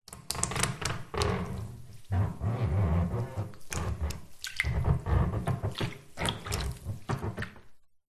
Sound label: household bath water very shallow movements body rub squeak room reverb; zapsplat